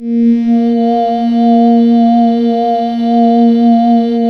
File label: A#, Synclav FM; Big Fish Audio; SPACE1